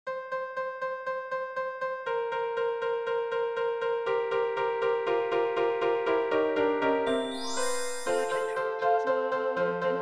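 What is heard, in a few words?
Jingle bells Tenor; 2021 Xmas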